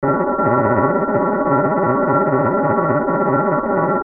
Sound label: Surface Roughness